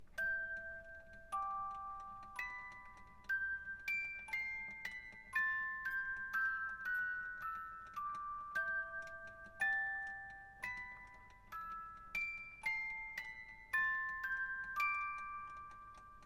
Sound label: Hymne Spain